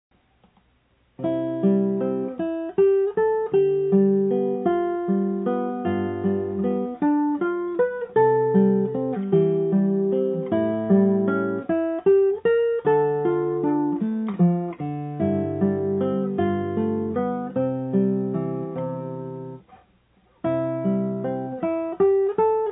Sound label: nocturno, guitar